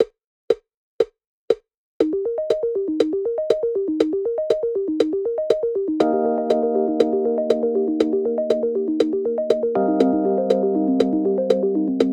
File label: with click 120bpm; backing tracks